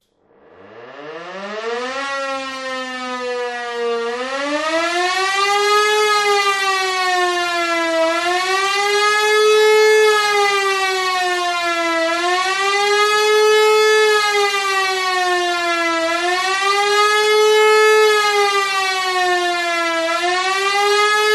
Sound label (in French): alarme; accident nucleaire